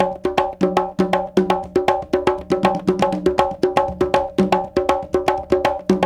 Bembe 6/8, World Dance; Spectrasonics; Supreme Beats

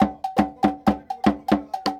120 WOOD

